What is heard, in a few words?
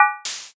basket